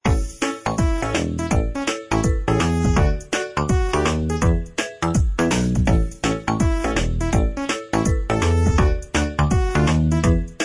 Video Game

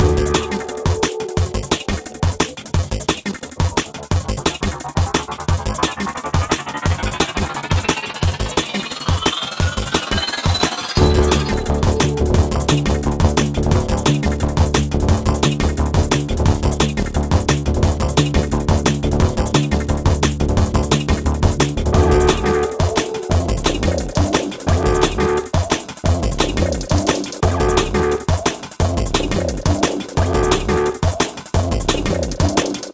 Lab Boss Music; pixelworlds

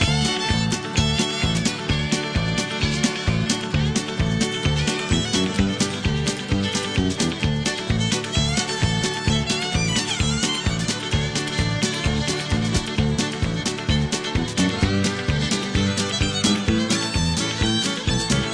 Fishing Music; pixelworlds

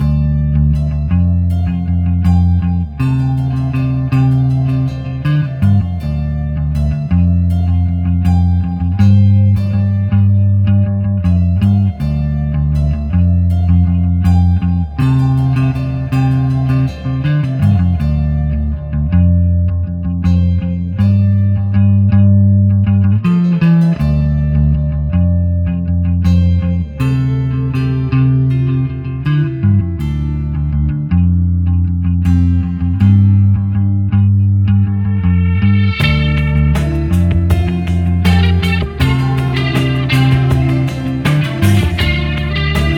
garage; ets

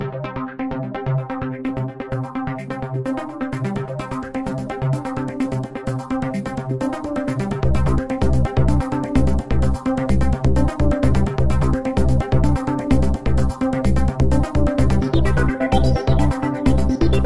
Puzzle Music; pixelworlds

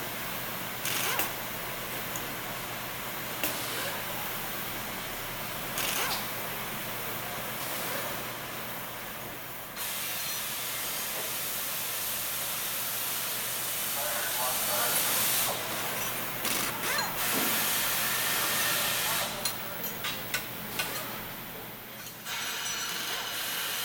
truck service; ets

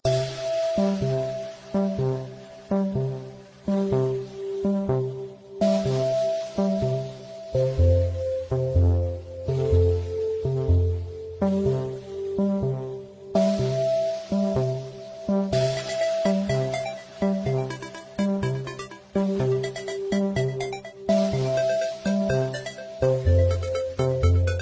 Pixels Retro Video Game Loop; pixelworlds

